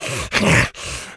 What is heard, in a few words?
zombie spawn; undying